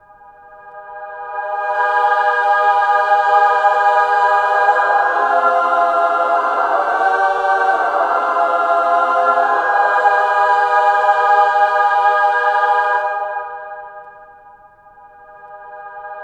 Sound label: MINOR W REV, MIN. WOMEN